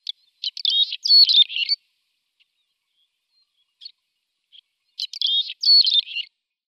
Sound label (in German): Roetelschwalbe Hirundo daurica